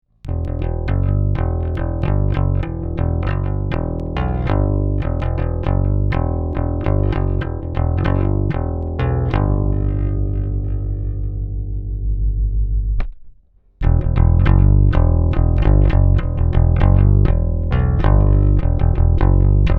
Camden Bass

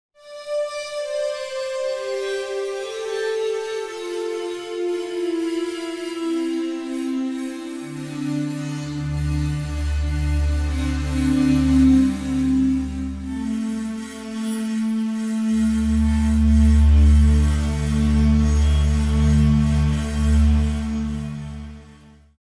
Bowed

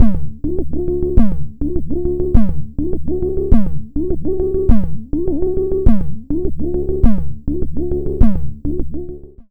Leploop V3 Loop

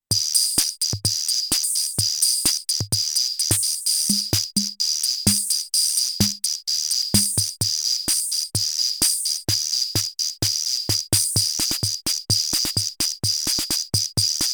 Roland TR 8S CR 78S Disco

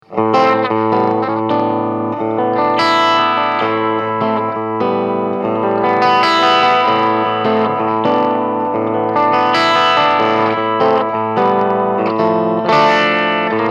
Laney Cub Super8 Volume Full Tone Full

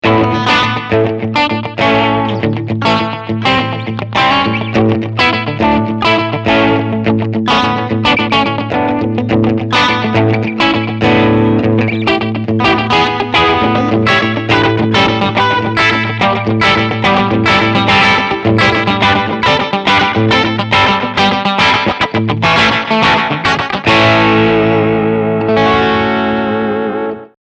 Slapback Echo

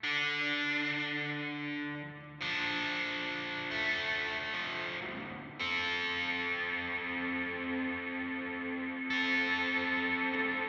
Walrus Audio Melee D R SWELL

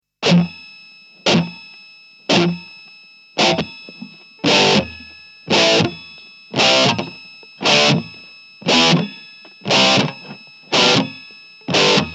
Noise Ring Active Pickups

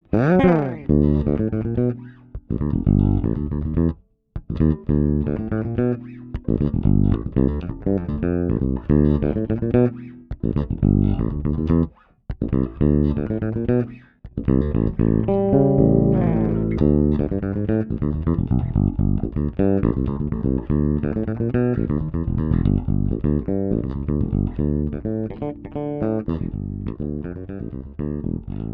Fingerstyle

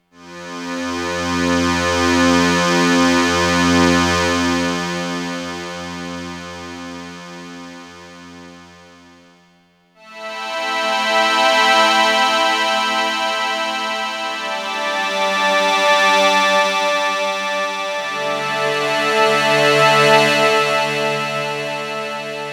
Modal Argon8

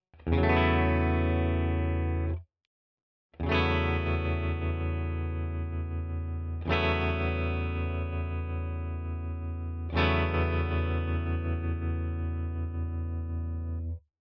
Walrus Fundamental TREMOLO Random

